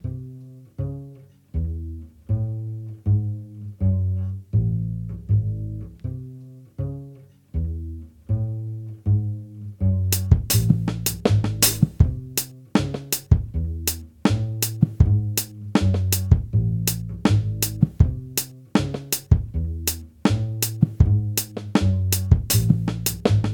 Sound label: Vintage Beats Pieces, Vintage Beat; Audiowanderer; AW Legacy Bundle